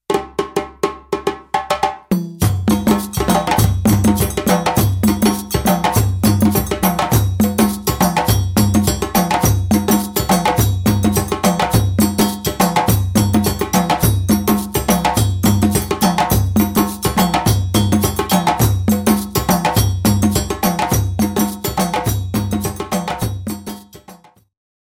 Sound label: fanga whole rhythm; rhythms